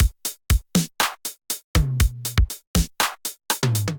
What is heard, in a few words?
707 Kit, Drum Machines; 707 Rok With-U Kit; Ableton; Factory Packs; Drum Essentials